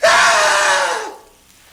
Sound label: screeem